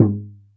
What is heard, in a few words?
AFRK SOFT; AKAI S-Series CD-ROM Sound Library VOL-2